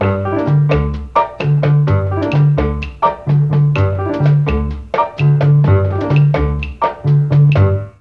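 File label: bass strings, Mellotron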